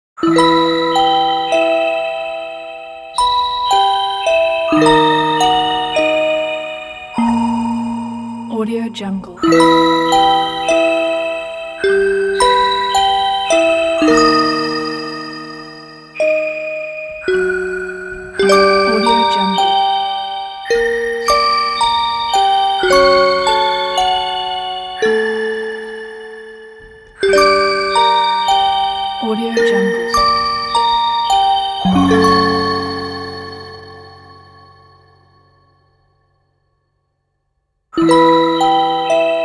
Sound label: evil scary music box